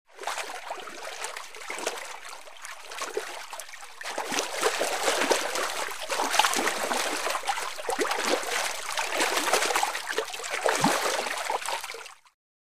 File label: Medium Heavy Water, splash